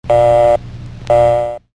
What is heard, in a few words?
Busy Signal